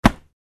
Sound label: Catching a rugby ball